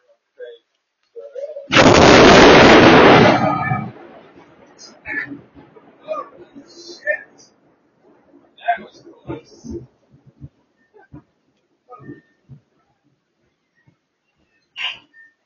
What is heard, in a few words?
Boom